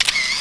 camera click sms; ringtones

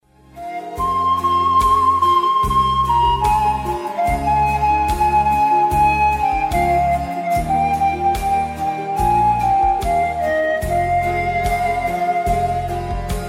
E Panflute Siren

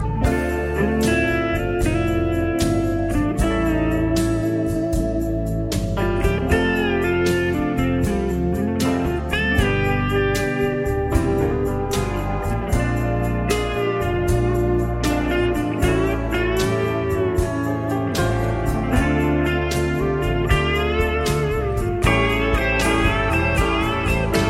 ultimate Love Flute

